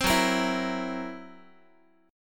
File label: Fsharp 7th Flat 5th down Guitar; F#7b5 strummed